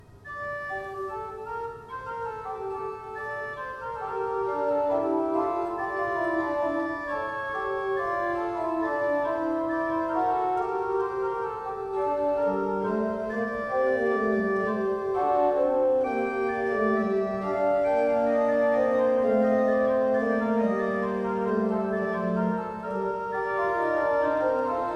pipe organ; Baltes

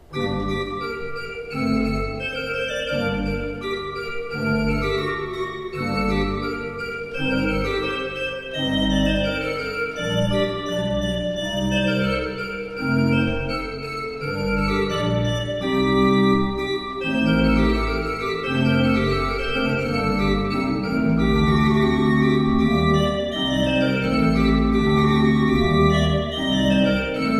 Ott, pipeorgans

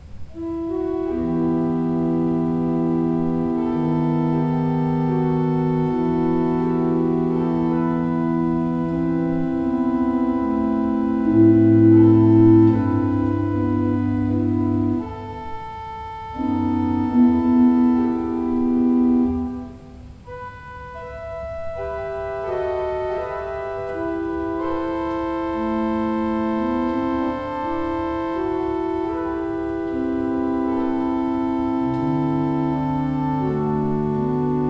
John Fearnley, pipe organ

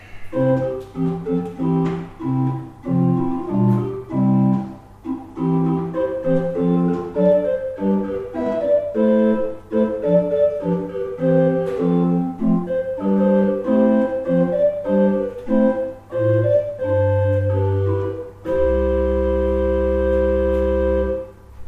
pipe organ

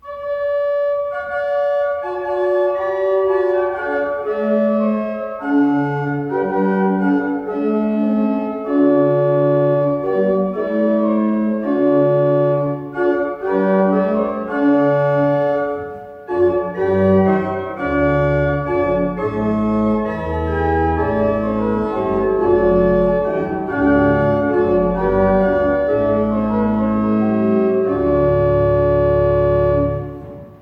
Becker, pipe organ

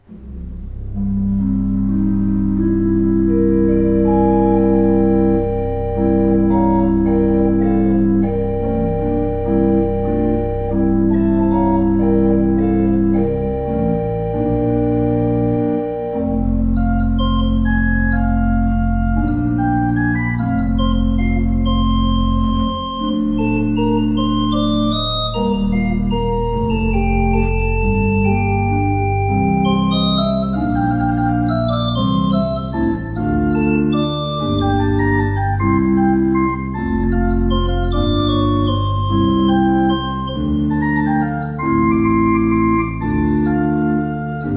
pipe organ; Kleuker; In einer Höhle

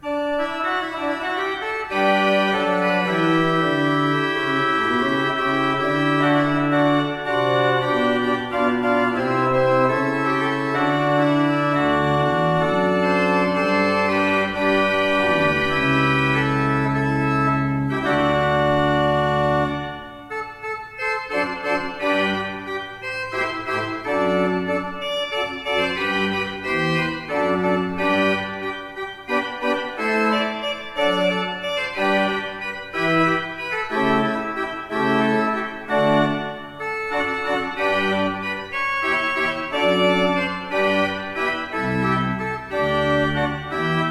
pipeorgans

